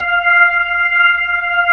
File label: B-3, Jazz